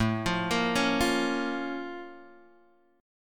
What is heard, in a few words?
A sharp Minor Major 7th